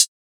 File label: Hat; Oranges; high rise kit vol 1